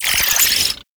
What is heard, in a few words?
Brood Spider Vocal; Warframe; Oraxia